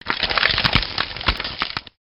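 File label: symbol freeze; La Gran Aventura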